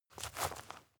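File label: step dirt heavy boots soft, footsteps; schritte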